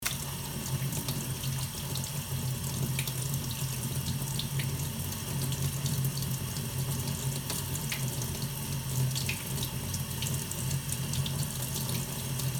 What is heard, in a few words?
Waterfall